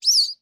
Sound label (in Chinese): 海豚待机声